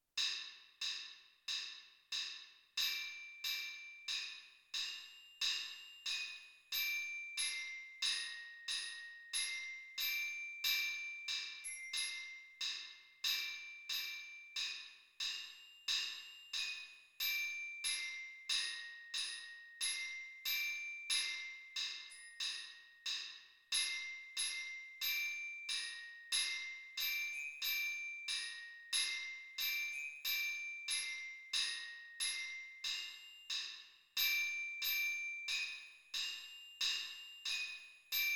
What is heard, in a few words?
theme from symphony no. 9 orchestra bells